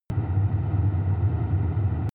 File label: drone hollow wind low